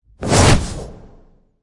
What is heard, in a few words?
Wipe; Ninja Tracks